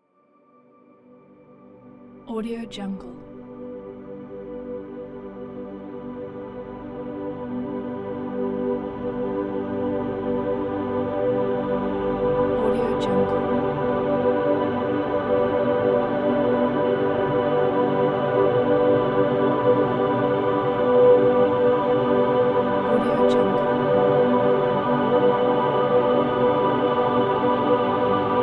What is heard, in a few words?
airy ethereal majestic choir drone